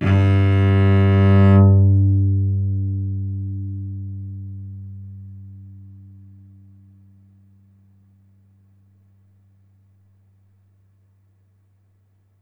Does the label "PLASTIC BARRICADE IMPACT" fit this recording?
No